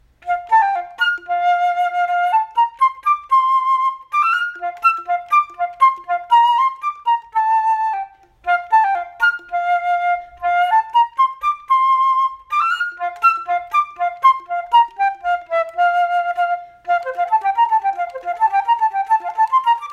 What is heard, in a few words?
Flute